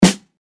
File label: high, kit; chrome music lab